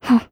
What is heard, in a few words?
laugh; Eternal Return; Katja; KOR